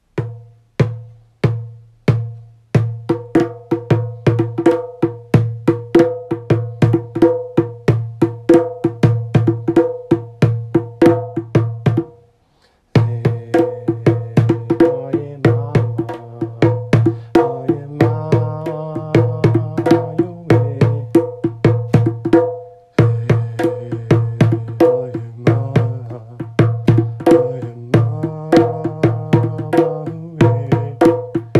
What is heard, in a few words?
Djembe